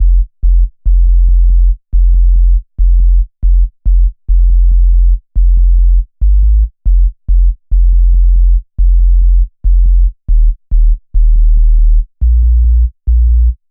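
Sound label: bass synth loop F major; 140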